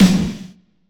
big room snare sound f sharp key

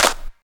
Clear Steel Snare Drum D Key

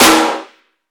large room steel snare drum sample E key

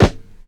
rich lows snare sound g key

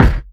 present trap kick sample f sharp key; kick drum samples

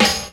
clear hip hop steel snare drum e key

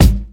dry kickdrum sample g sharp key

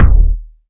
subtle reverb kick drum one shot D# key

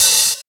damped hi hat one shot d sharp; hat samples

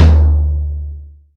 long tail reverb tome drum sample d sharp key

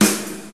pop snare drum sound g sharp key

